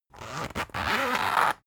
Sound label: zipper run long slow; objekte; reissverschluss